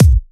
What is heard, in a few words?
Bassdrums